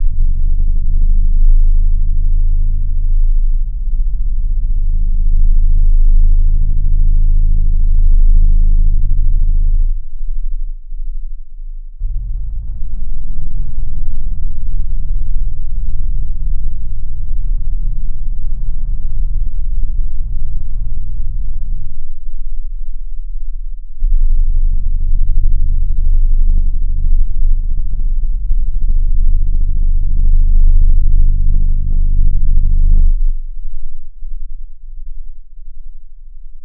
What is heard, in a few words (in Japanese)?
「～10Hz～30Hz」; 脳絶頂中